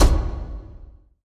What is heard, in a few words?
linked Reel Stop; twin spin mw